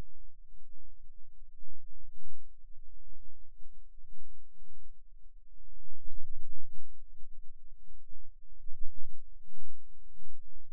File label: Rhythm Wave, eeg seizures